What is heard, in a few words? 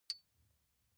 medium metal impact hard high